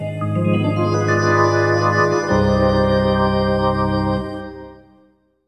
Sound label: Hockey Organ